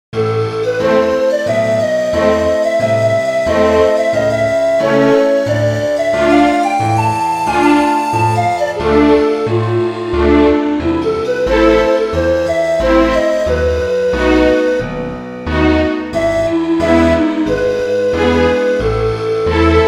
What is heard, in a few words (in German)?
orchester